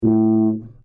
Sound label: tuba; orchestration